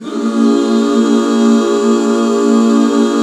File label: BB7, Back Chords; Sound & Vision - Gigapack II CD 1